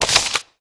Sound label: paper; tressa